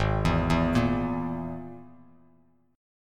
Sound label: G sharp Suspended 2nd Sharp 5th G sharp down Bass Standard; G#sus2#5; arpeggiated; JGuitar